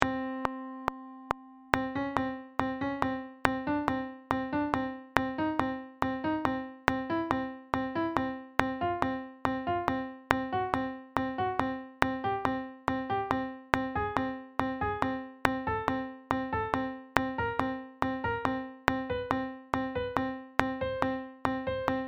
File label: pitch training up